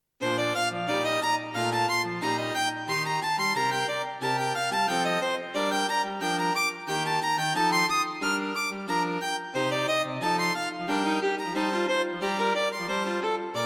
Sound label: A modern piece with jazz; String Quartet for Concert performance; Plenty of interweaving parts and interesting harmonies.; Not too difficult to play.